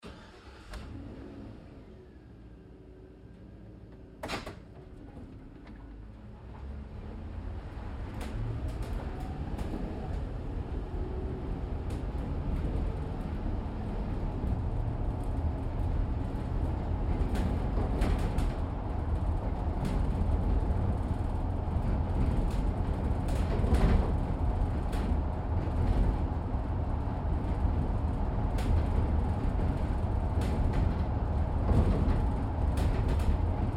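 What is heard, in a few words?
Truck GMC Savana interior Drive Bumpy; 2010 GMC Savana; Vehicles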